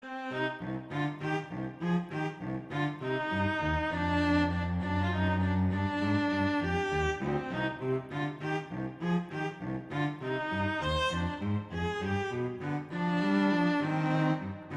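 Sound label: Andante non troppo lento, violin cello; Mazas; Duets; Duet 6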